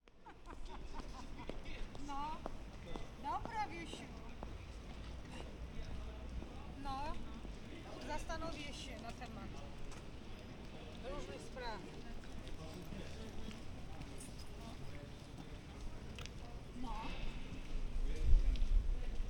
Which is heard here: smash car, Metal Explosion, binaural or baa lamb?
binaural